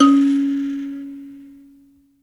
PLK BUZZ, Buzz Kalimba, Africa; Roland LCDP11 Africa VOL-1; Buzz & HiBz

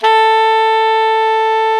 A mid, AltoSax; Giga Samples Collection; Brass

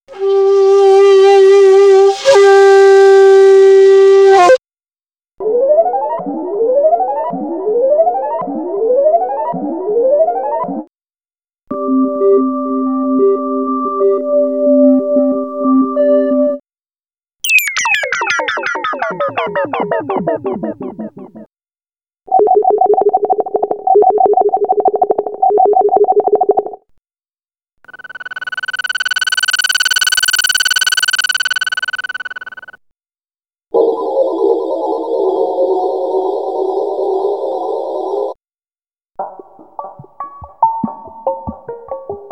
Ambient; Demo Samples from Ambient; Time + Space - What's Next!

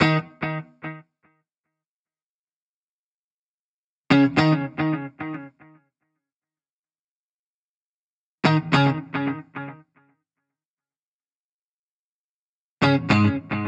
Guitar Bpm110 Key D# minor, 70s Guitars; Fox Samples; Back to the 70s Guitars

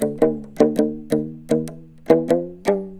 PAPANG, Indian Traditions; USB Soundscan vol.45; AKAI

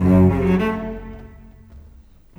Bass Cello; Sonic Foundry (Sony Creative Software); Orchestral Series VOL-4 - Rock and Pop

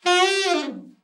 ALT FALL, ALT-SAX FALL; Giga Samples Collection

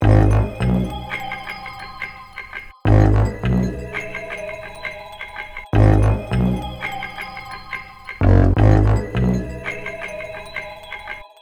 Melody, Hip-Hop

